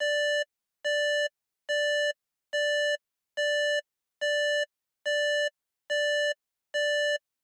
Pulse At 95bpm

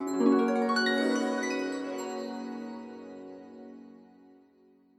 Miracle Harp

